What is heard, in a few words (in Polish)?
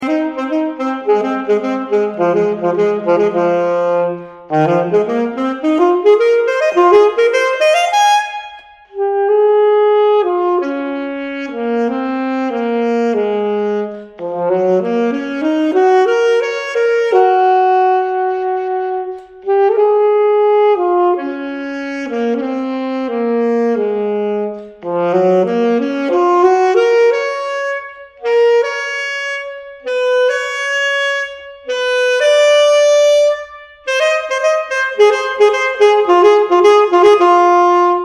saksofon